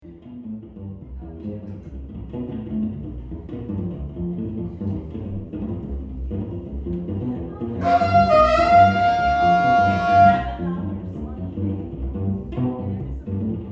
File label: Mostly Sax